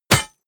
solid short medium, hits impacts

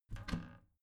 bathroom cabinet both open soft

